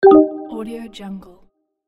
app negative; gamechestaudio

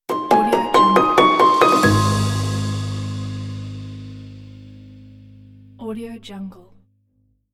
piano uplifting logo; audiochameleon

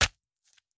stab punch hit

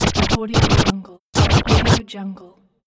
dj scratch record

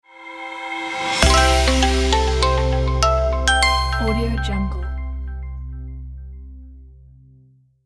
media logo

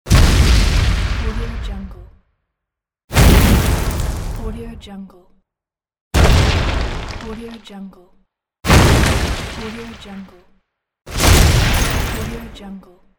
explosion; tibasound

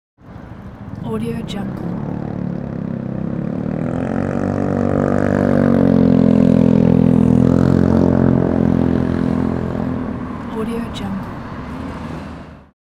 motorcycle passing by; zdravinjo

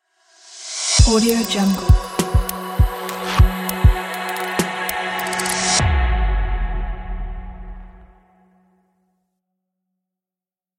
podcast intro open logo

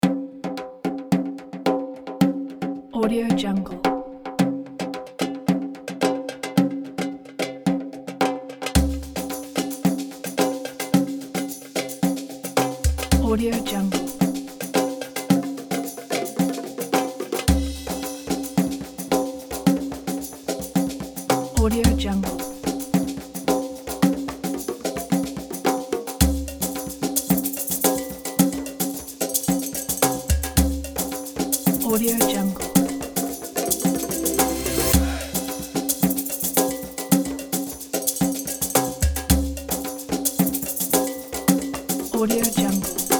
ramadan percussion

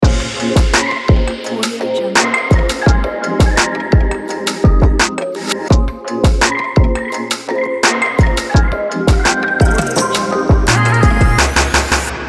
chill trap logo

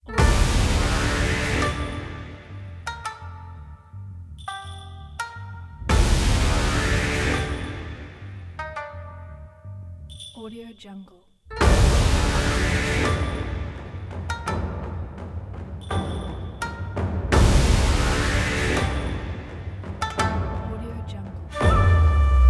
epic powerful japanese; shigenobuorito

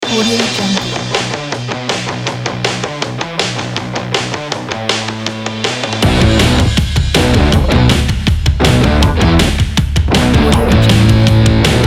upbeat fuzzy desert rock

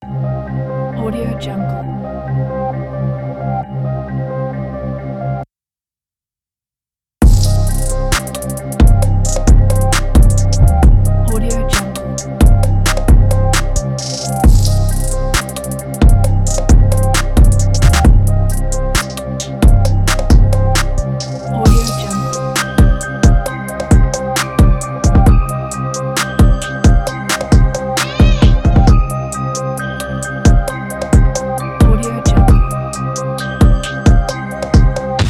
fight night dark trap

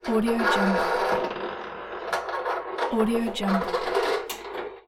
old creaky door

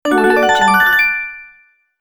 bonus

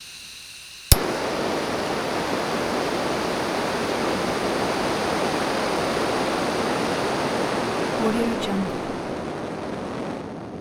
flamethrower

